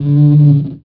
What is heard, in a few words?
rub; BESTSHOT; noisybit